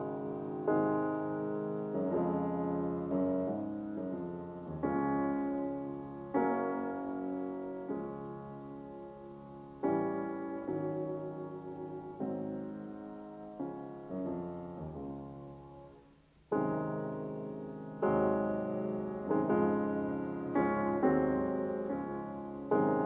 Piano Sonata No 23 in F minor Op 57 Appassionata II Andante con moto; Beethoven; Walter Gieseking